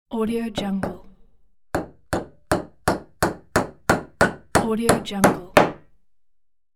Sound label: hammer